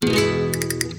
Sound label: Castanets